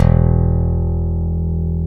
PICK BASS

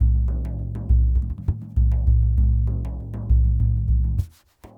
Lg Frame, World Percussion

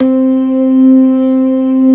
LOW MAN, organ combos; Keith Emerson Signature Series

